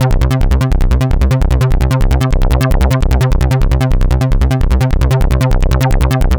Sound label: Bassline, Prototechno